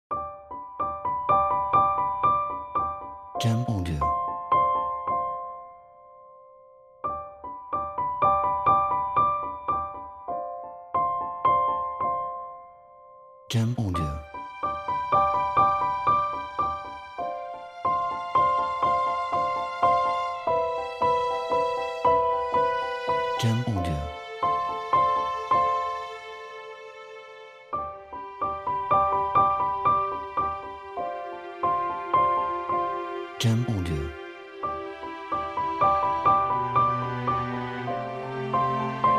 Piano Inspiring Orchestra

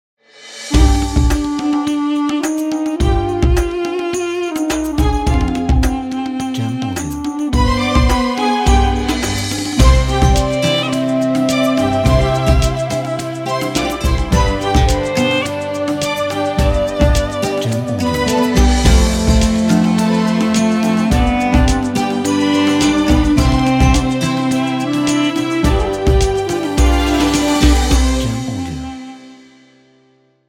Ramadan Arabian

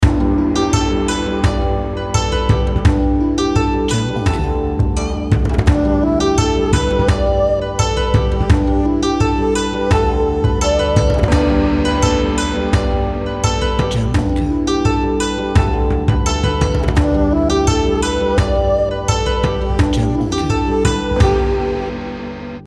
Asian Loop 170 bpm

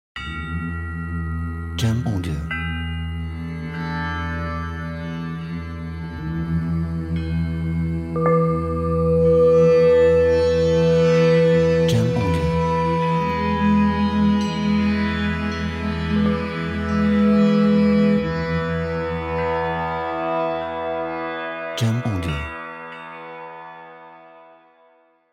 Indian Sitar Singing Bowls

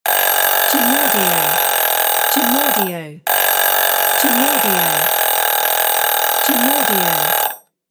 Alarm Clock Ringing

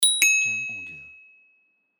Achievement

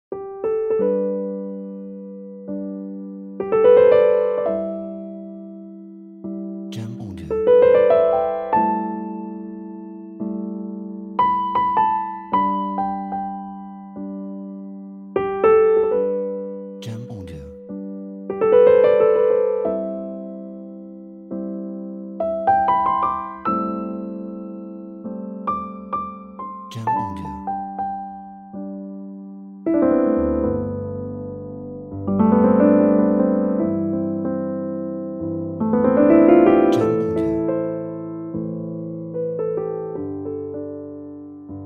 Classical Art Pacified

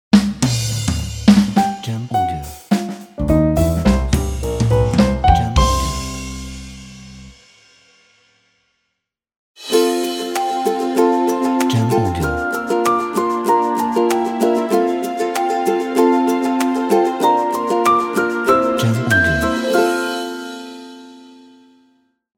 Positive Logo; Positive Logo Pack

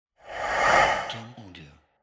Horror Breath

